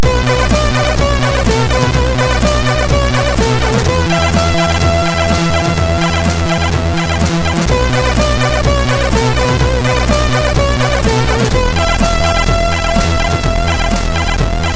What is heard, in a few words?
MOS6581R3; sid